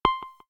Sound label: Video Record, ui; Coolpad; C558